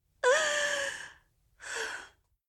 Awaken Babble Cries; Zara

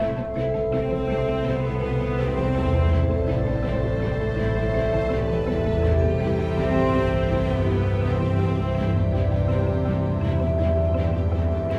loop Sad; the walking dead michonne 1 in too deep

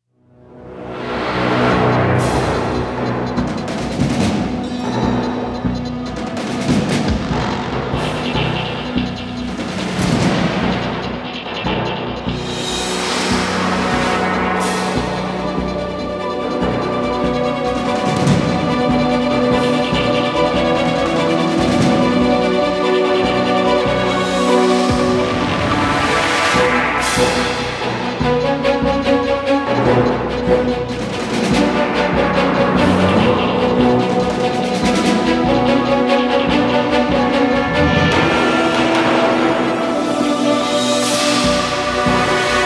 Combat Dogfight; Elite Dangerous

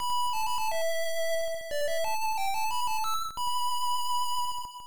Fue (Whistle); fds; Risa no Yousei Densetsu

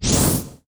Fire Column; A Robot Named Fight

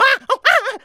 Monkey Near Falling

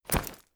Impact Gravel Heavy; adams venture origins

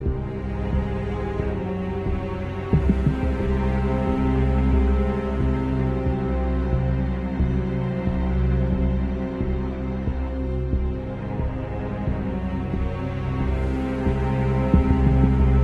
loop Suspense

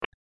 Chip Pressed; My Cute Commissar

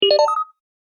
Speed Increase; Duck Life 3

